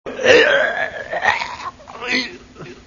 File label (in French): vocale etranglement